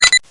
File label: required action click click